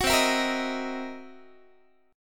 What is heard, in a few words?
C 7th Sharp 9th Flat 5th, C, down, Guitar; strummed; JGuitar